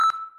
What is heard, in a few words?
pulse